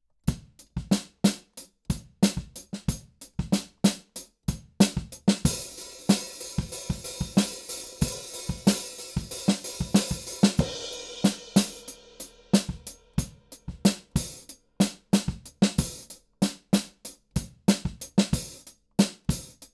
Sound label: KIT